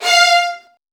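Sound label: violin; Virtuoso Strings; F2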